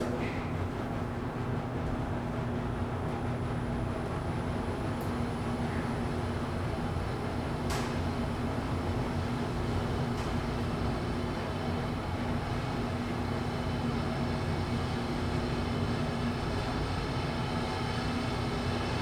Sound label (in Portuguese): Interior de elevador com forte ventuinha do ar condicionado; Cerrado; maquinas; colecao sonora do cerrado